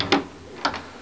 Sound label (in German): TUER AUF